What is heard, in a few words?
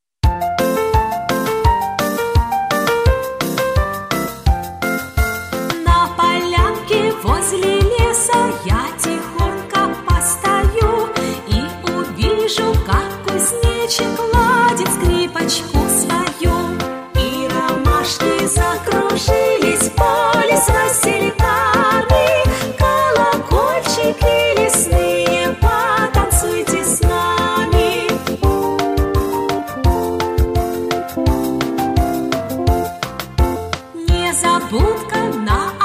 polka